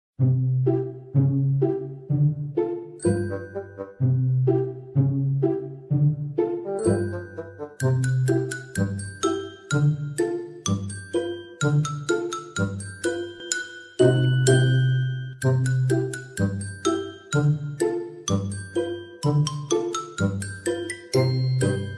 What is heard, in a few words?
background music; cinema sins